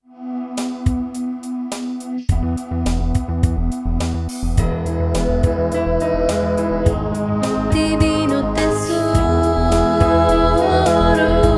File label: Jingle; Divino Tesoro